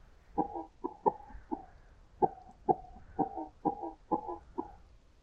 cough; guardian final cough; HLD

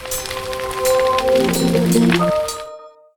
Level Up War; Risk of Rain

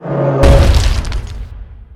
bison shoot; Risk of Rain

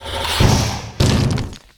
Lizard Death; Risk of Rain

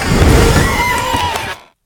Wisp Death; Risk of Rain

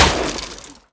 Hitlist; Risk of Rain

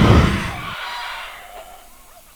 Imp Death; Risk of Rain

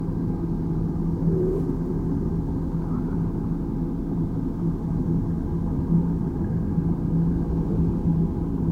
big robot in the jar; HLD

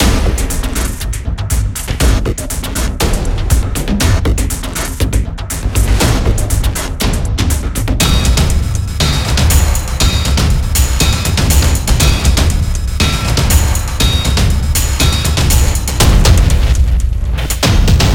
Test Song Allert; synthetik

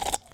Mush; Risk of Rain

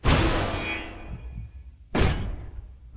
reaper death; HLD

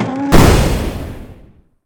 Wisp Shoot; Risk of Rain

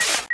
Janitor Shoot; Risk of Rain

